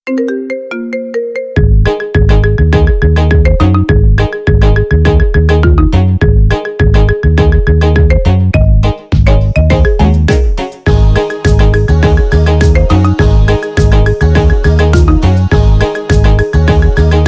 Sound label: marimba remix